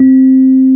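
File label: Hard EP C4, electric piano; SoundFont - The Developer's Choice - Volume 1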